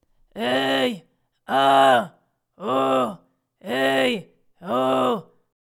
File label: push; les effets simples